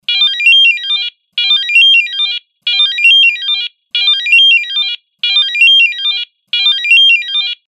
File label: Alerte